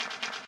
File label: steps stairs